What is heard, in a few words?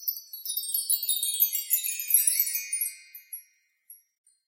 Chimes